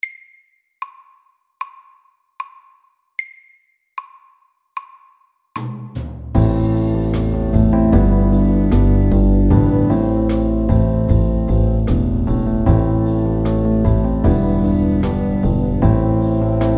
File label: Pop ballad FORT